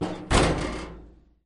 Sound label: door, metal